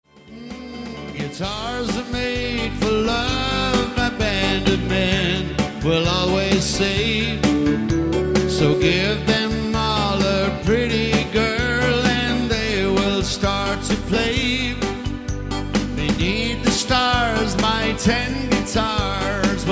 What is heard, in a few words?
Country Ten Guitars